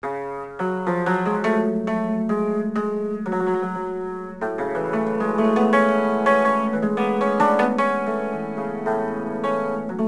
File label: CIMBALOM